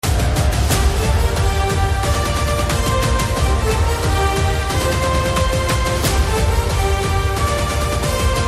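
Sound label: Trailer background music Ringtone; Sadak 2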